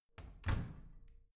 washing machine close soft

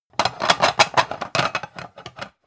pan rattle

